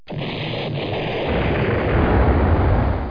EXPL

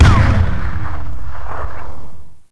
GASGUN; Holiday Software Sampler (Sierra On-Line) (1998); SWAT2DEMO

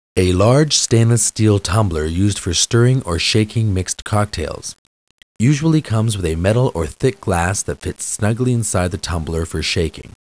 shaker

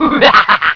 Gunner Noise; BOOM

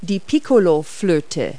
PICCOLO; Multi-Lingual Talking Picture Dictionary

